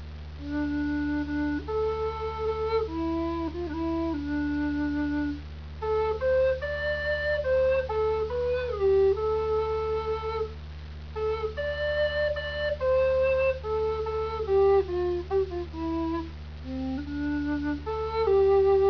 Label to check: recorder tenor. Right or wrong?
Right